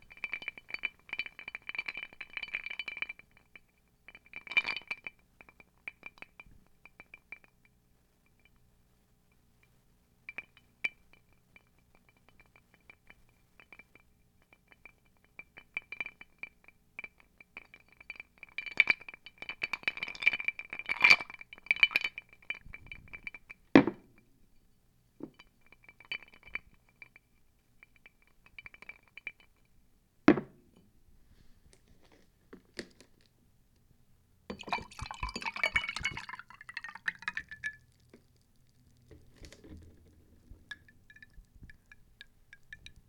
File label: Ice Rattle